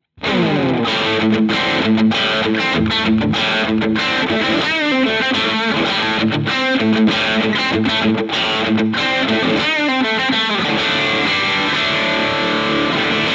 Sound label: characteristic sound; jensentone